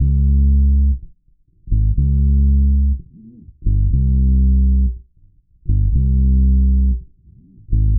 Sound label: bass, Loops